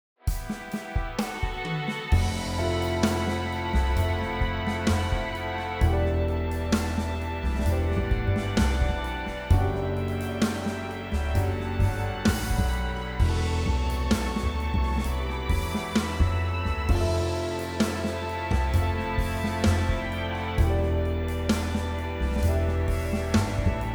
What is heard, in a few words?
STRINGS ARTICULATION OFF